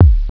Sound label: Kick